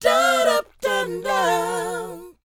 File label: DOWOP C 4; ILIO; Vocal Planet